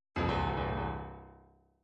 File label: midi fills rock